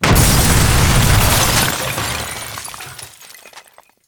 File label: van inside debris; Left 4 Dead 2